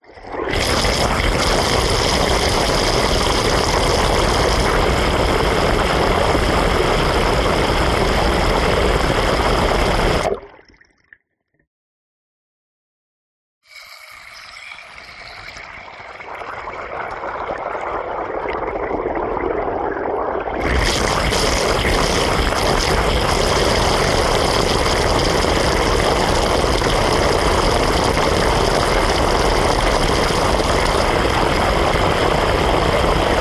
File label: hydrophone pour faucet into plastic jar